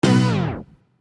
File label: Stab; Sonic Foundry (Sony Creative Software); Infinite Soul VOL-1